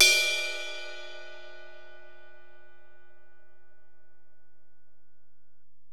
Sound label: Ride, Alesis DM-PRO, Drum Machines